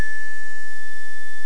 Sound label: steady; Material: High-impact plastic; Wiring: Red-warble, Yellow-steady, White-common